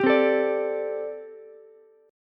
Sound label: A Minor 11th A Ukulele C6, Chord; Am11 strummed; JGuitar